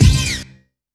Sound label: G3, synth line; 90 s Sample CDs; NiceBeats; SynthLine VOL-3